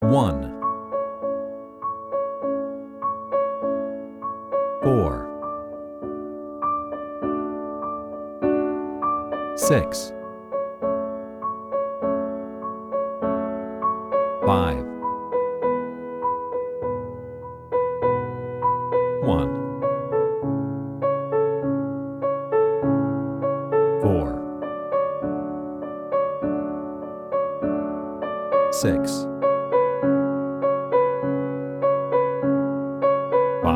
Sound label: I IV vi V piano A